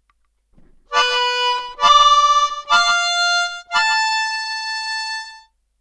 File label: 5 holes octaves C chromatic, harp